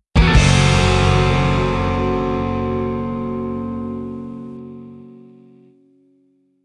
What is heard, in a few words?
Exiting Vehicle Jingle; The Simpsons Hit & Run; PS2; Fresh Skid Marks